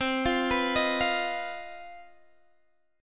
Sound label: C Minor Major 7th Double Flat 5th chord, Guitar Open D